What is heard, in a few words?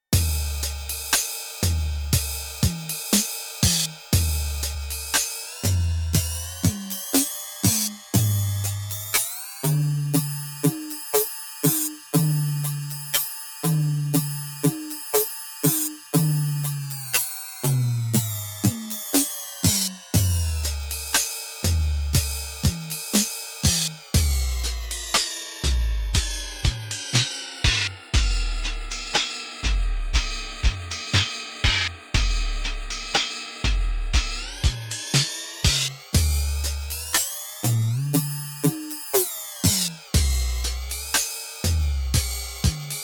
NTS-3 Drum Pitch Shifter